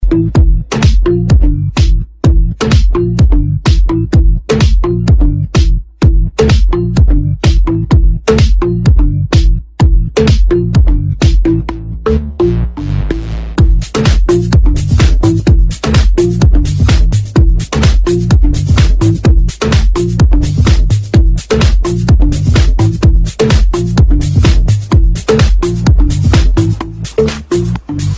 Electro House

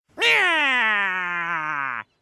Annoying Sound

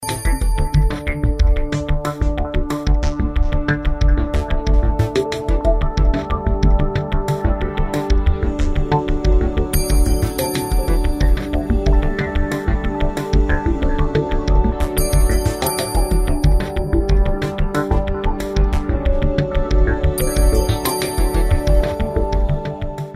Romantic

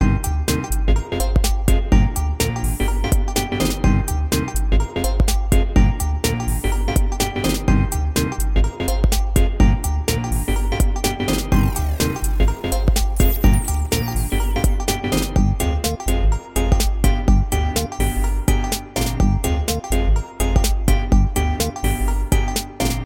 Garage ringtone

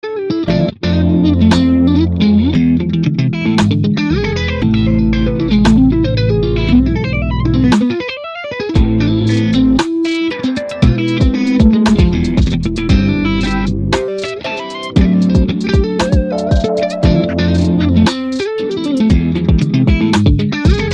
Shred

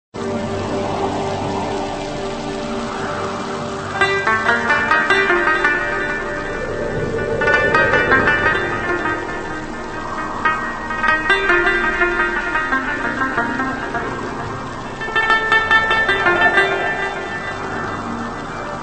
Pashto

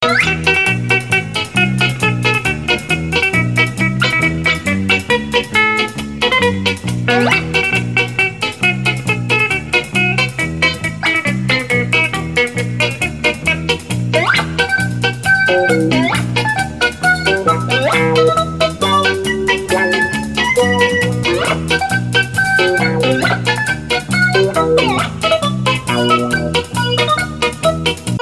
Balkan Music

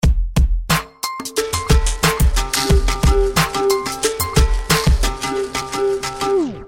Jazzy

